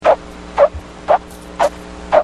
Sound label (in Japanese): 琴ヶ浜（網屋）の浜を歩いた音; 鳴り砂; これは浜を歩いたときの鳴り砂の音の周波数分析結果であるが、明らかに、単音である。